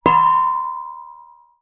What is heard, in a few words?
industrial metal post driver impact light resonate; zapsplat